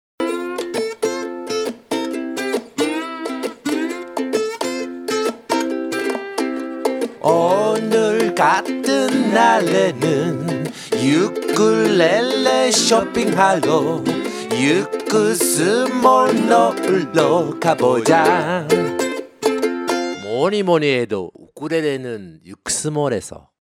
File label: Logo Song